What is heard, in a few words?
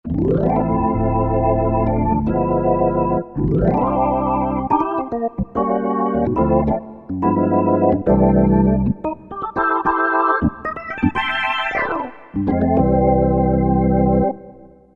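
Gospel Organ, Korg 05RW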